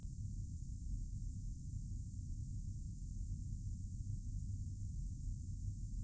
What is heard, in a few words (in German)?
gruenes rauschen